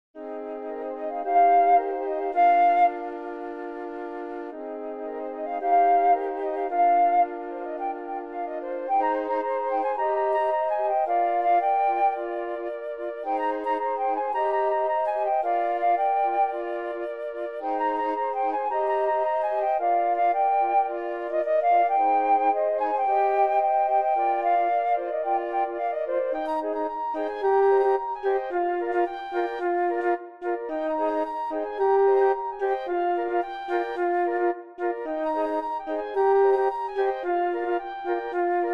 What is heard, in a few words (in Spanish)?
cancion flauta